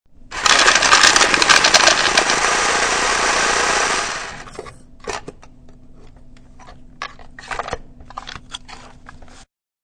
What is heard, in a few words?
Automatic card shuffler does its thing; Card Sound Effects; Casinos